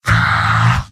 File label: heavy negative vocalization; mvm